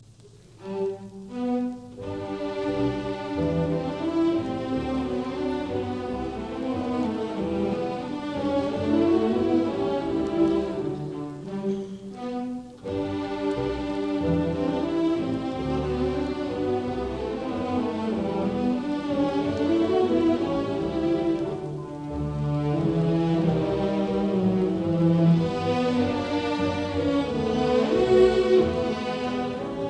Symphony in G major, Allegro ma non troppo, Symphony Orchestra; Dvorak